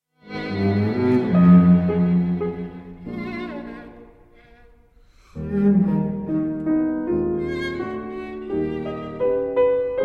variations on a Viennese Heurigen melody op 9 for piano trio; doris adam